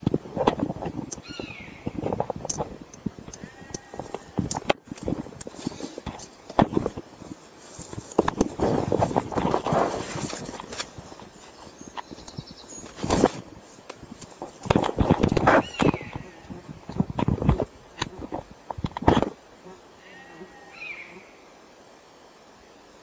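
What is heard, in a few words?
anger, honey buzzard; hexhamshire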